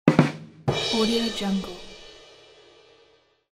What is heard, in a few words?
bad joke comedy drum roll